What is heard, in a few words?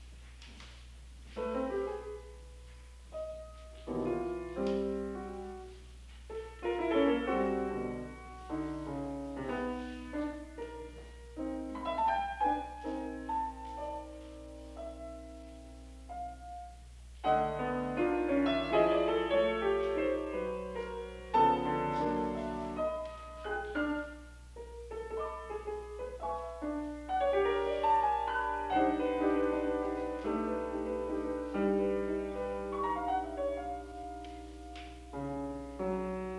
Six Kleine Klavier Stucke